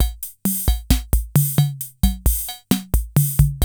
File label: KR55 SAMBA; Crypton - DrumBase5000; KR55B